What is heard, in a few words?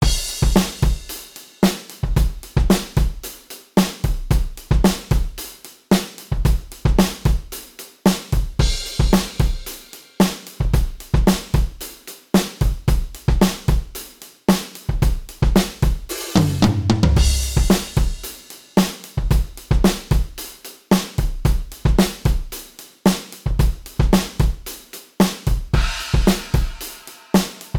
modal surf, drums